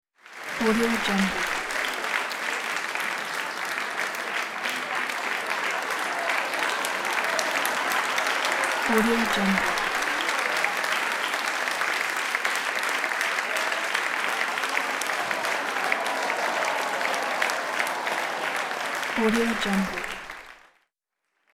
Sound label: crowd applause; leksab